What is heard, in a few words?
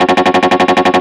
tremolo mute; osu